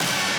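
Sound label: b stab1b, note F octave 5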